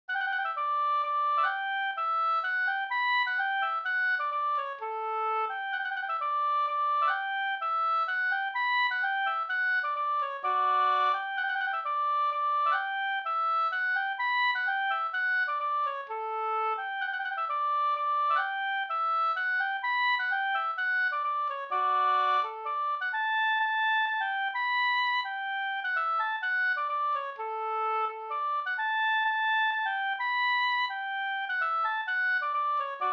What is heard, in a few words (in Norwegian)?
pols Rorospols; e Morman no